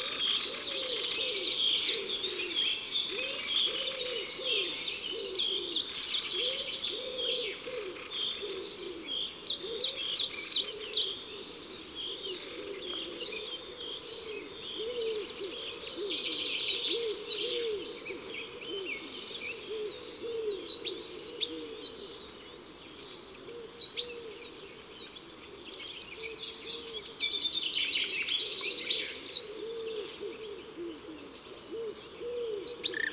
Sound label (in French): Mesange noire Concerts Naturels de la Drome; Studio les Trois Becs